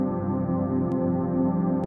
attack; ADSR

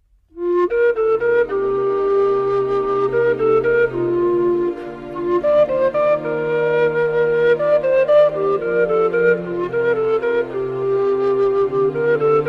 Peaceful Alarm Ringtone; Genshin Impact

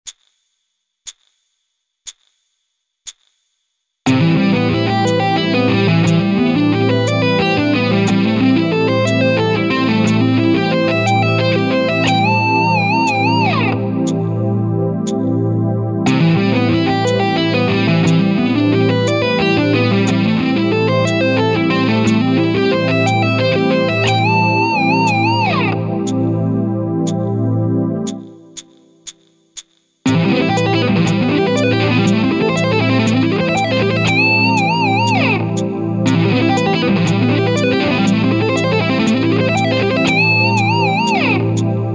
Sweep

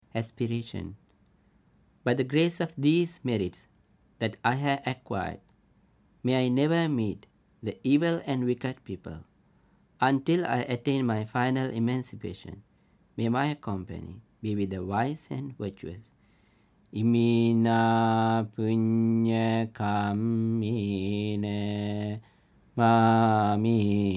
aspiration, Paritta chanting

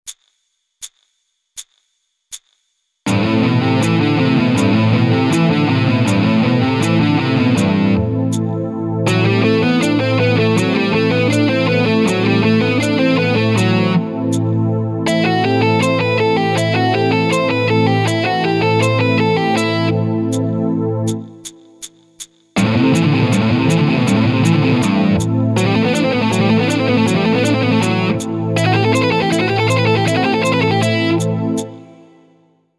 economy picking

